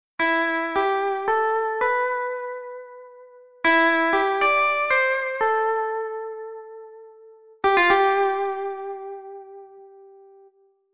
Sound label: clean vibrato